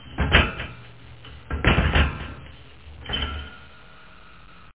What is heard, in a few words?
metal walk; Five Nights at Freddy's; FNAF 2